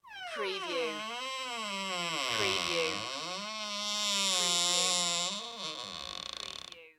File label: Door Squeak Long